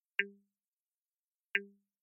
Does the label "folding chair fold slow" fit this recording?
No